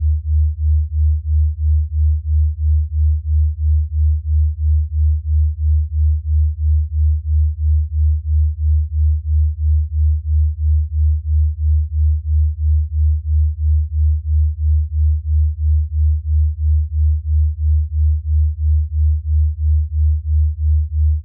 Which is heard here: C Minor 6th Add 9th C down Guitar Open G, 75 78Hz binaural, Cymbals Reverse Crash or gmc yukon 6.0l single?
75 78Hz binaural